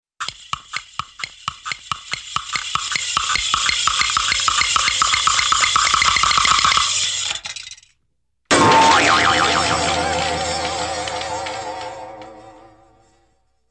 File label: Rapidly Building Wooden Clops & Mechanical Clock-like, cartoon; Hollywood Edge; Cartoon Trax